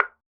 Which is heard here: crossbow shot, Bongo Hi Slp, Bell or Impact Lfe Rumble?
Bongo Hi Slp